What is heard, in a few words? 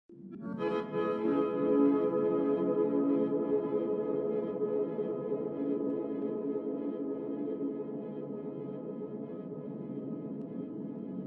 music hideout play base 155bpm; Omori sound replacements